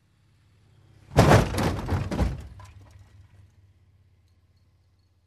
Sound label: Car Crash